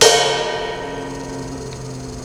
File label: guitar legato